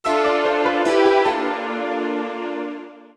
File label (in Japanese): ジングル：詩人